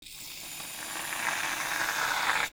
shaving cream, Bathroom

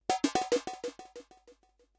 space trash; Kenney's Sound Pack; Digital Audio

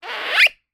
Scrape, Bodyboard; InspectorJ; Hidden Voices

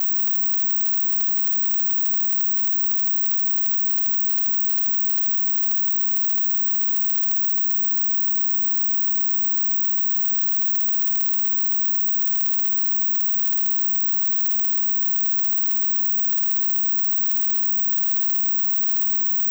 Signal Interference; Glitchedtones

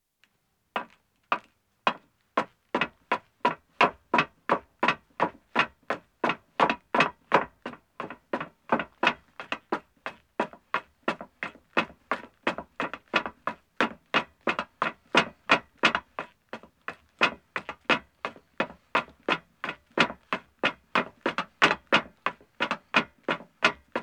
Metal Stairs Ascending, 1 Man, Footsteps; BBC Sound Effects Library; Footsteps II

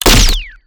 ABSTRACT GUNS

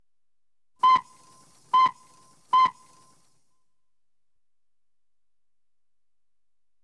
Steam Launch, Hooter; Ships And Boats; Victoria; BBC Sound Effects Library; Ships And Boats 2

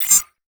Bleeps Blops Clicks, UI Menu; David Dumais Audio

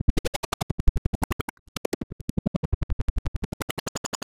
Loop - 170 bpm, Glitch Noise; 99Sounds; Mangling Audio

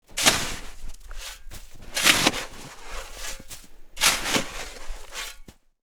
shoveling snow

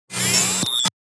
Tech, Weird Side Samples

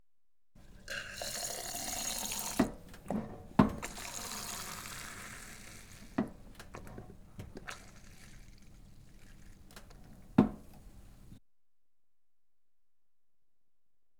Pulling A Pint Of Beer; CROWDS; BBC Sound Effects Library; Schools & Crowds

